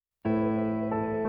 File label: Duet